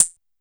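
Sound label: Hi-Hat Closed Synth; YAMAHA RM1X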